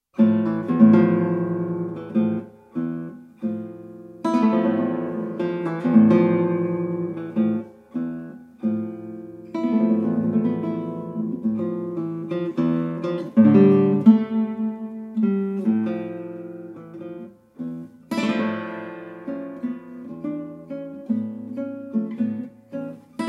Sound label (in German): Spanische Gitarre